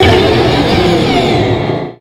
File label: pokemon cry